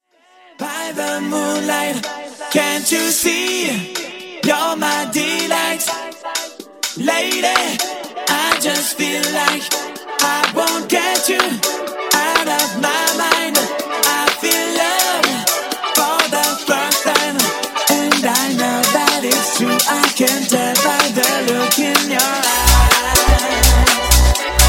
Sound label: Funk Clean 125 Bpm